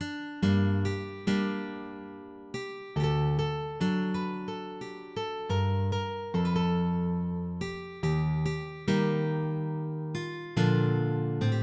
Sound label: Sarabande, MIDI